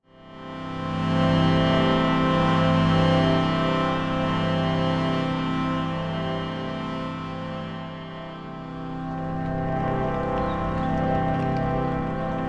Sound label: chord